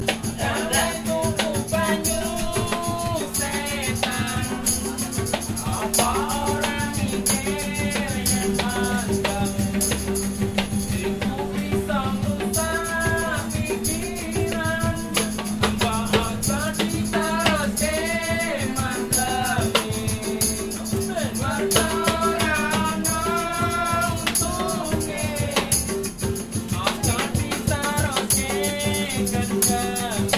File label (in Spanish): musica callejera